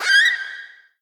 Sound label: ghoul teleport; Crypt of the NecroDancer